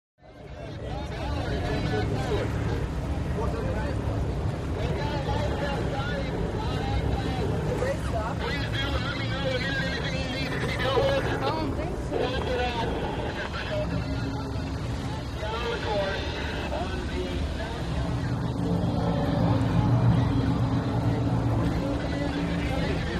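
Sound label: Power Boat Race Ambience, off shore power boat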